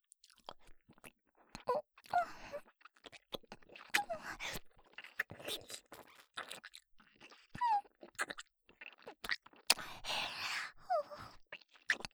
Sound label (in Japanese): フェラ音